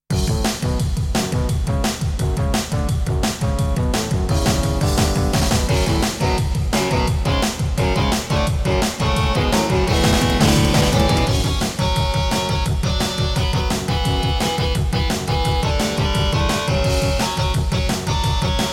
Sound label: Intro, Yamaha CBX-T3; Duke Nukem 3D